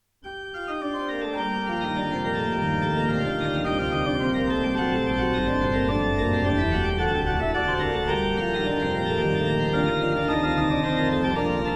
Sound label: organ, choral preludes; Vom Himmel kam der Engel Schar; Bach; Bach Organ Works